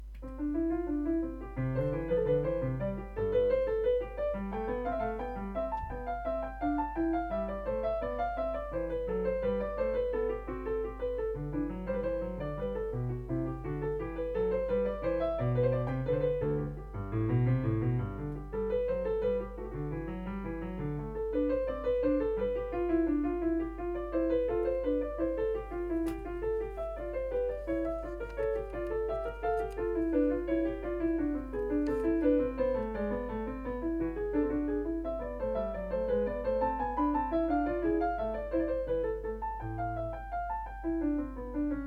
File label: invention, piano